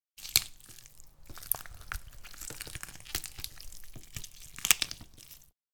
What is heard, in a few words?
Chickens eating flesh